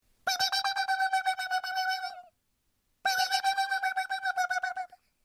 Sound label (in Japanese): エンマコオロギの声